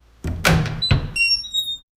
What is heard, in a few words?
sound of a metal door